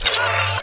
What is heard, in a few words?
deactivate; MOTS